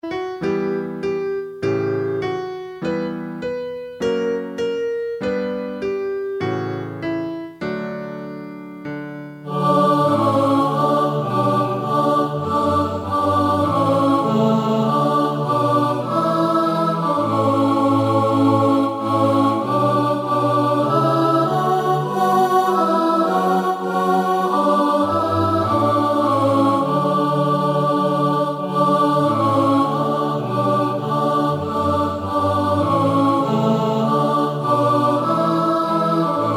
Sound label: ALTO